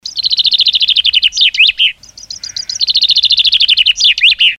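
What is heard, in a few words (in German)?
Zwitschern für Opener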